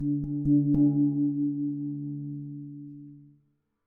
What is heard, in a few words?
gong